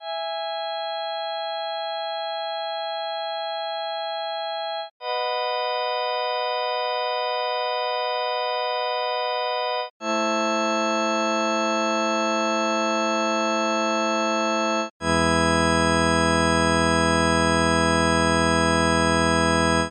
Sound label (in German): fis2 tief; mendelssohn sommernachtstraum